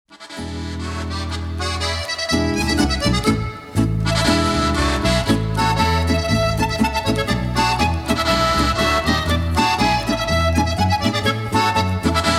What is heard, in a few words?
Polka